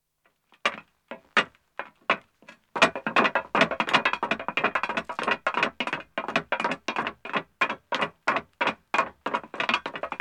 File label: Metal Stairs Descending, 2 Men, footsteps; BBC Sound Effects Library